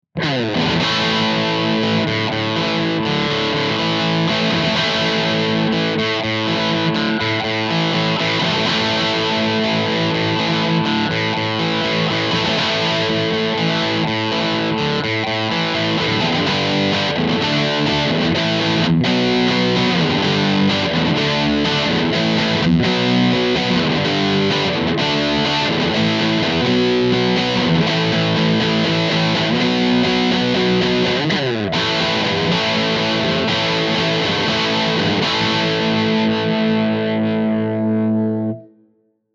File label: characteristic sound; jensen tone